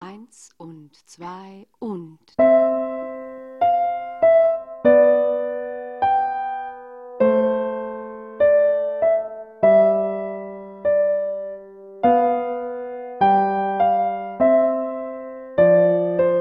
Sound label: Gavotte, Piano; Play Piano Klassik